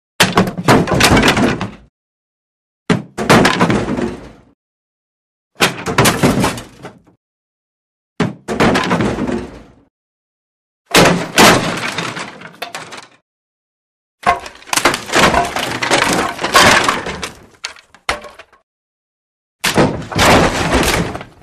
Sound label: Wood Falls Heavy Lumber Fall & Tumble On Ground With; Hollywood Edge Foley Sound Library